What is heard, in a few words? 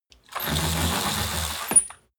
roll-down curtain roll up